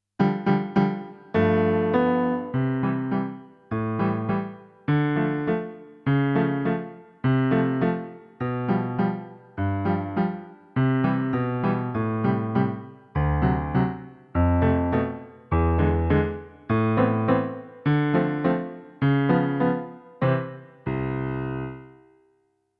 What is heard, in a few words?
karaoke